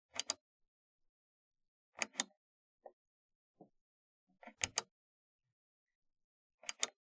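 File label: sounds of device clicks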